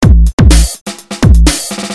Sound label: depressing rock music